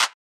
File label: Clap